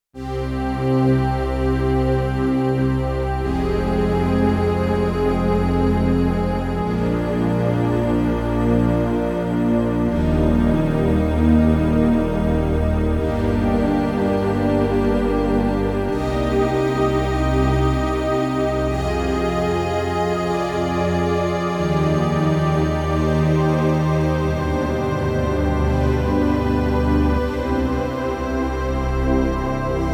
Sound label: Hybrid Strings, Roland JD-800; Factory Patches